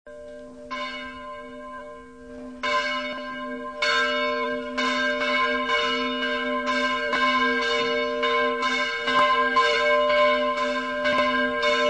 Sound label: Church bell; Christmas